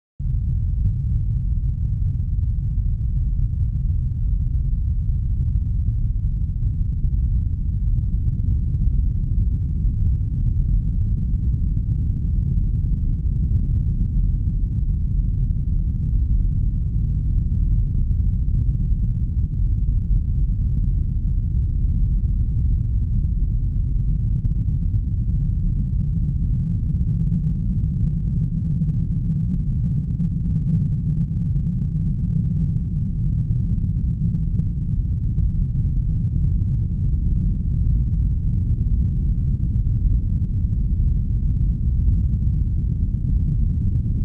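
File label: low end rumble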